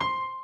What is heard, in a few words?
piano, programmable speaker